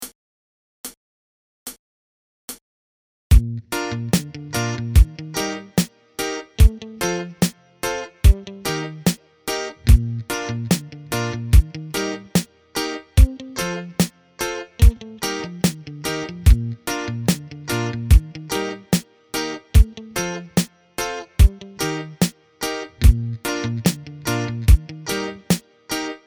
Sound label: reggae pattern, bass playalongs